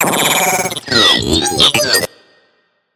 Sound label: Demon